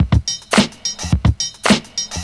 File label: 107 bpm 00s drum loop sample c sharp key